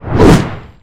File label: swing; balor